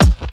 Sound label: snappy rap bass drum one shot a sharp key